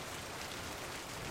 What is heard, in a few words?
rain